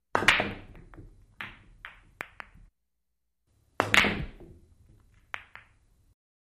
Billiard Ball Bankin

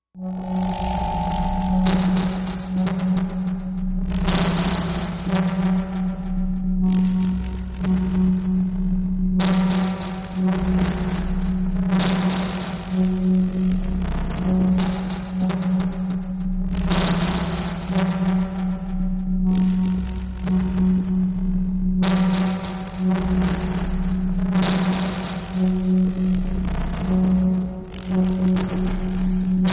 Atomic Moth Moving Elec, energy beams

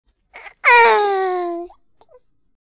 Baby Cooing Single

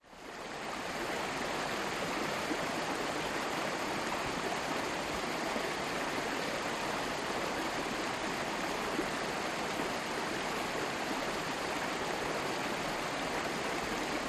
Small Fast Moving Riv, rivers

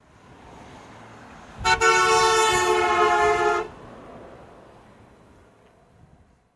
Car Doppler Dbl Horn B, doppler horn bys

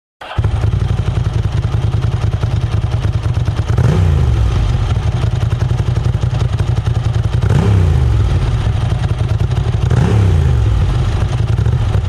Motorcycle Road Racing

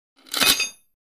Shackles Iron Chain D, impacts & crashes metal

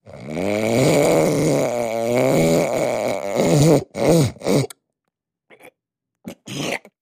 Snort, hawking; coughing & spitting

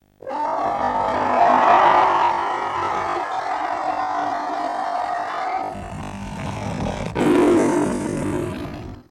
Elephant Growls Roar

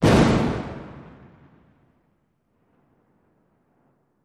1x4 Oz Concussion

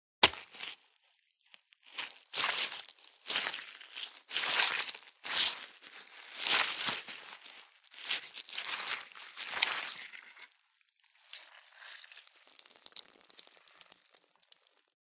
Cut Heart Out Fast, cut flesh

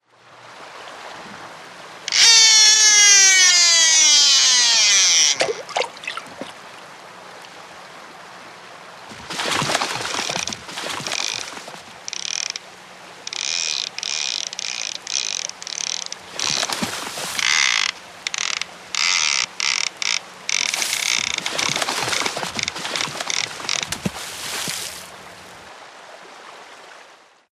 Fish Reel Cast Plop Sp, fishing

